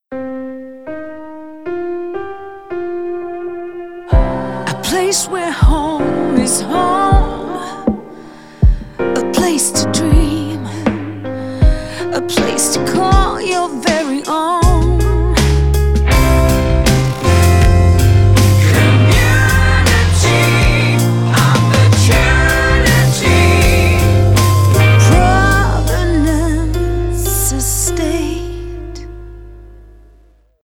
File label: full vocal